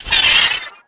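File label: droid spider; Star Wars Knights Of The Old Republic II; XBOX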